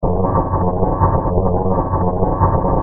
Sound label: groove